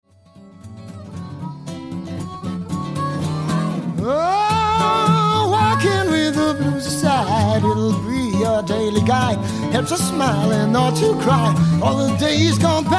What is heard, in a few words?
Harp